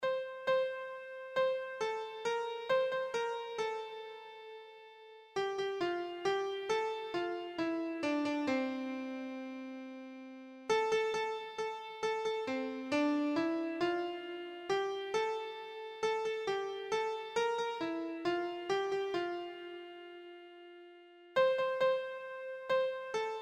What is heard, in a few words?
black velvet band keyboard